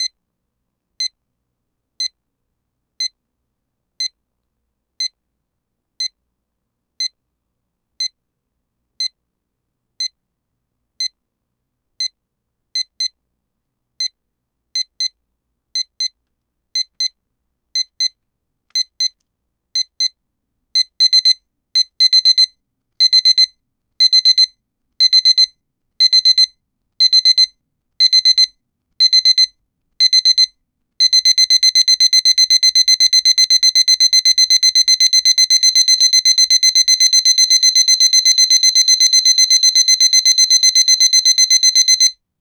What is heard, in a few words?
alarm clock